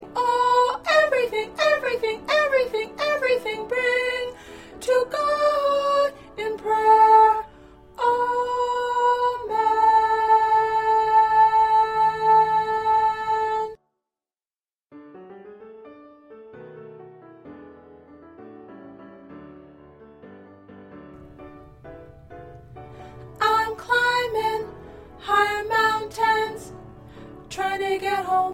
Soprano; What A Friend

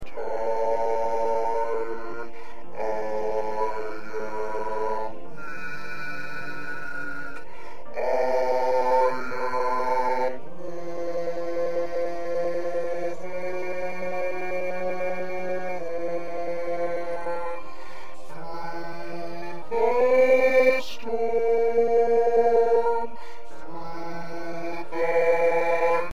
Bass

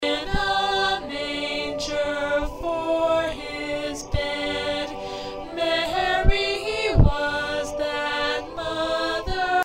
Alto

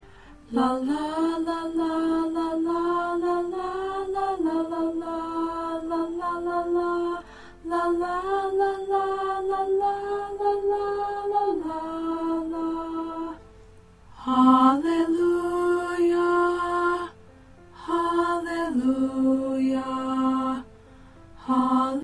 Alto, choir

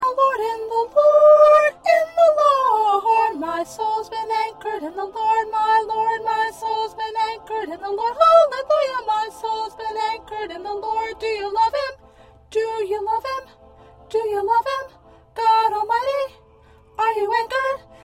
1st Soprano

